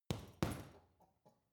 boxing punching bag side hook double soft; sport freizeit; boxen

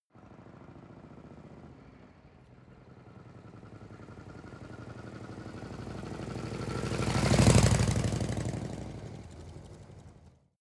motorcycle harley drive by medium speed

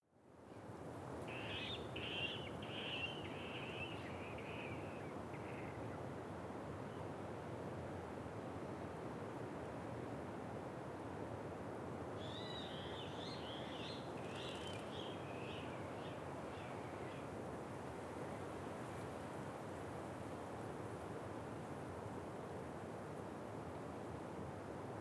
quiet wind birds cry

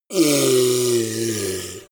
ogre grunt short

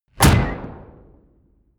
sci-fi shot rough

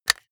jigsaw button off; maschinen; saege; soundpool

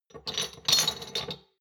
shot glasses grab multiple medium; berufe; gastronomie; soundpool

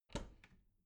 bathroom cabinet door open soft

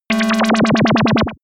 synthetic wobble; bewegung; comedy-cartoon; soundpool

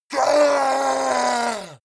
yeti attack shout aggressive command; schreie

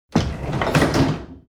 door old close

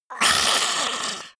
monster throaty assault; kreaturen

